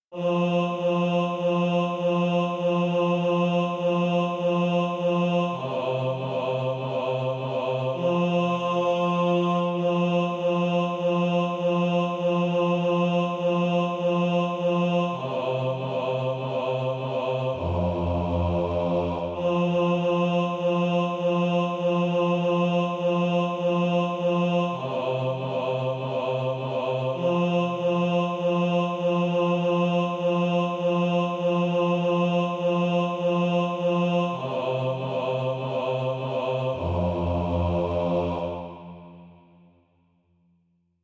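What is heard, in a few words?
bass, SATB Practise Music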